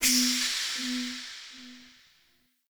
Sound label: Appear; Orlick; Friendly